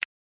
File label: button Down; hotline